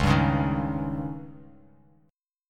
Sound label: C sharp Suspended 2nd Flat 5th; strummed; 9,10,11,8